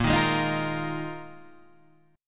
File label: Eb Suspended 2nd Suspended 4th